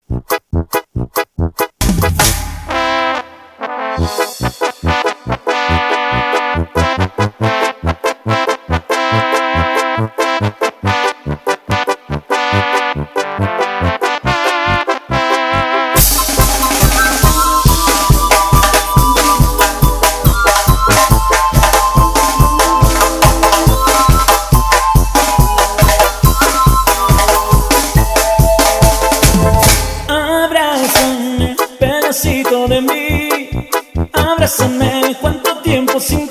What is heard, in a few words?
Duranguense Extended 140 BPM